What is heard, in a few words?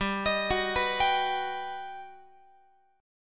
G Augmented Major 7th, Guitar; arpeggiated